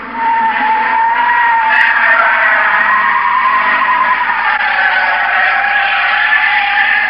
car screech

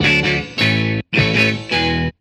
guitar handyman; Loops; Phonky

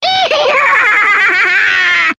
laugh cartoon

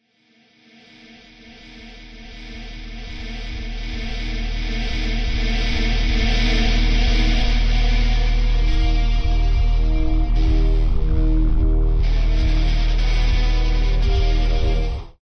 guitar lead in

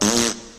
fart fake; Farts

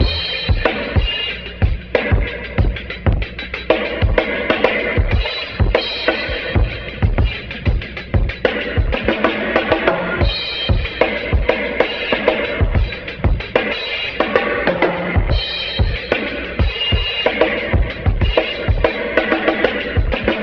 grunge band, funky drum loops

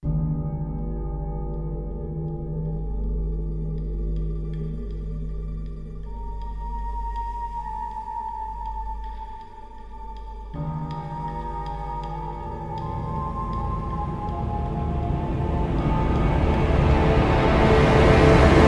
strings, sad loop; Loops

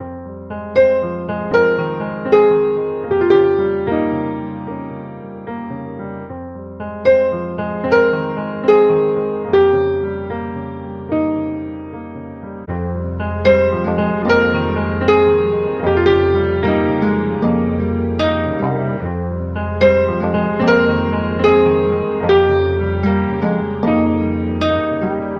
piano, Sad

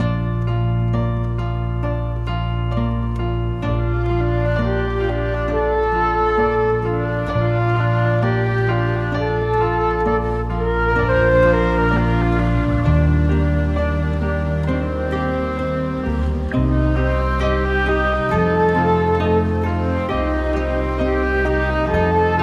flute

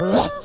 growl

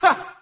huh male, Voice

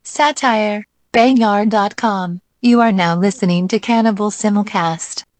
hold music; Voice

